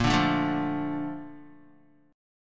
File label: Bb Major 7th, Guitar; BbM7 strummed; JGuitar